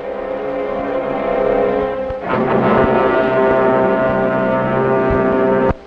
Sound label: dramatic fanfare